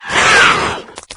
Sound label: attack; doom3